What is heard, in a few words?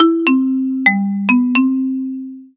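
Hello, ringeklokke; Ringeklokke med høy kvalitet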